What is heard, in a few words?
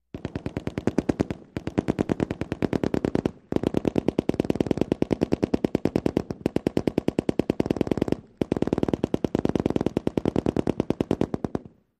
M-60: Two Firing Simultaneously. Stereo